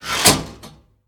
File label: Giant Switch Up; moul